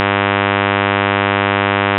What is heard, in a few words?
harmonics 100; akustikfort